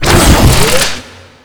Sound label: laser reload